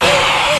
"ah", Voice

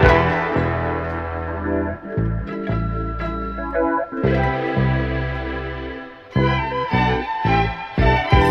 strings, phonky loops

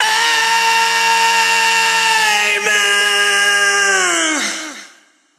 hey man SUNG

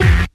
buzzed, Stabs

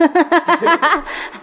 laugh drag; ooh ahh

